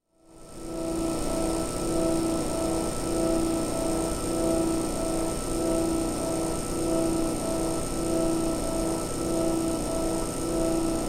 Soft Drink, Fountain, Compressor Running; Sound Ideas 2000 Series

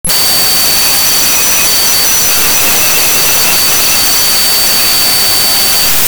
Coin Drop on Concrete; 1001 Sound Effects; Foley

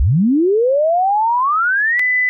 Reload; Blind Survival

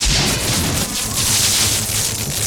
Sci-fi Electricity Hum Loop

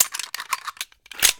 Gun Draw Select Pistol, Weapons Foley; SFX Kit

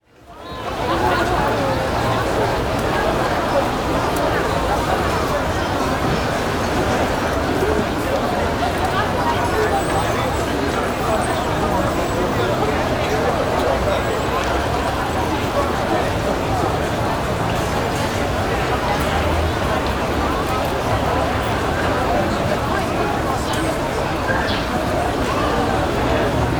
Market Large Outdoor, Walla; Sound Ideas; Walla Series

